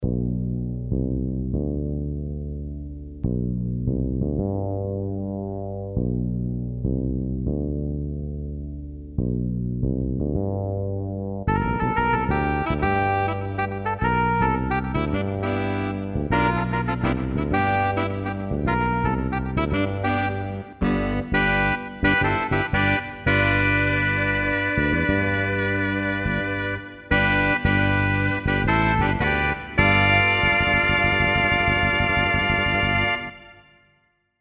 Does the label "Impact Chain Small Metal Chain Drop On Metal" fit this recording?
No